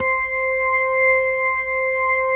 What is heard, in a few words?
C5, B3